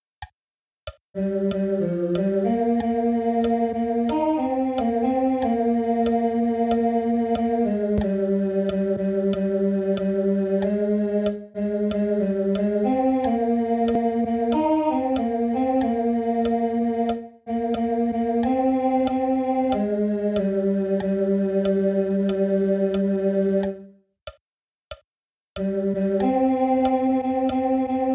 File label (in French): Regardez l humilité de Dieu, TEN